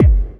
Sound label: BD, Dance Mega Drums, BD HOU; Best Service